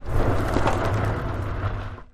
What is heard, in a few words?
Car Tires Dirt Skid; tires on dirt